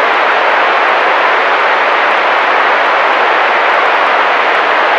pink noise stereo loop; Source Engine; Portal 2

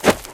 sand, footsteps, infected, walk

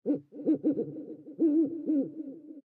owl; ambient; Source Engine; tf

